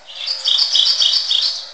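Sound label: Mourning Warbler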